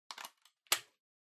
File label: Power Strip Adaptor Hand Gentle Plug In